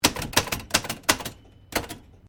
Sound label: Typewriter Remington Standard 10 1900s Sequence